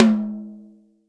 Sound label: tom, Real Drum Kit, funk kit